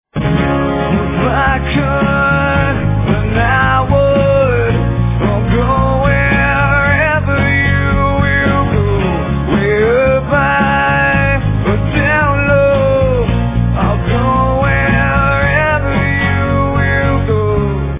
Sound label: Acoustic